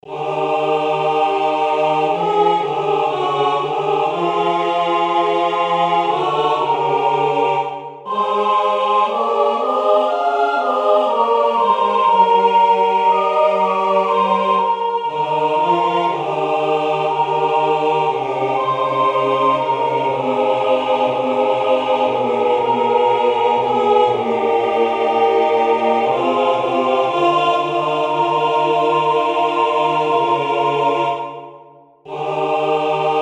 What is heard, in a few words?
tutti